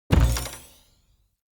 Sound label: Bow Shot Charged Twang; Weapons; Player; Josalfar Bow